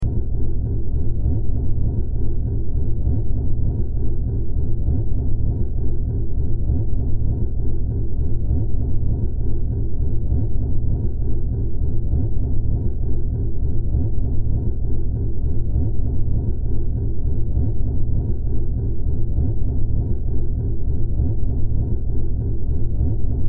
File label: Sci Fi Motor Hum Large Loop; Spacecraft; Blastwave